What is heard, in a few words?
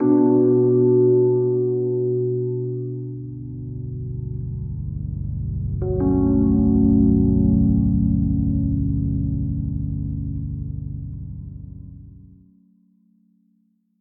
Sad sting, soundtrack; minecraft story mode gamerip 2016; A Block and a Hard Place